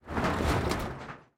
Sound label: truck rattle